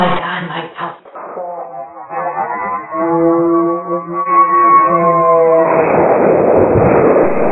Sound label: oh my god scream